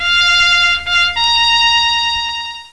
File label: TAPS